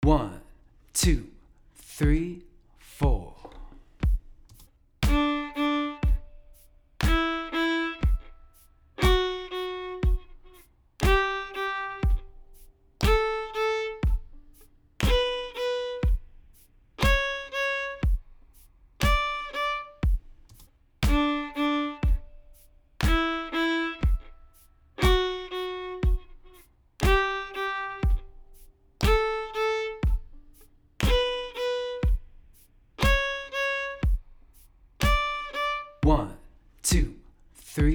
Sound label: offbeat accents eighth pairs d major, fiddle; offbeat accent patterns